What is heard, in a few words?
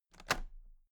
toolbox latches open heavy